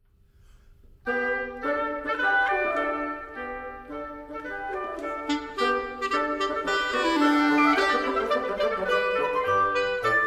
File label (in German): Holz; Ein Jaeger laengs dem Weiher ging